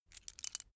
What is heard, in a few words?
key ring mid size put down; objekte; schluessel